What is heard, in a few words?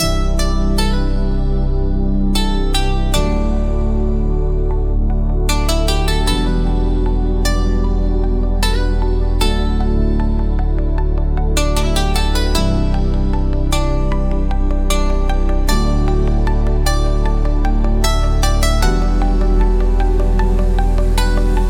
Moonlit Koto Garden, quiet japanese ambient